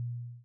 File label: page turn; two rivers